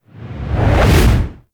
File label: Fall Whoosh; Foley; Warframe; Characters; Tenno